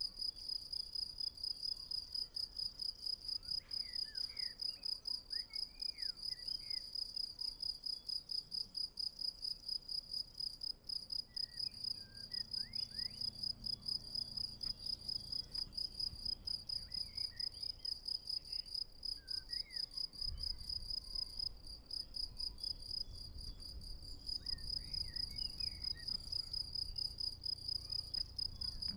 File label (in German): Feldgrille